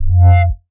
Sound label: bass tweak wuup F; Polyend; ARC Noise